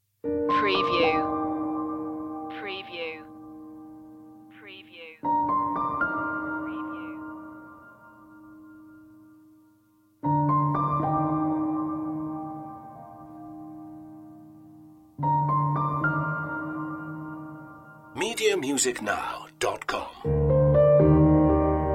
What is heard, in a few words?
just piano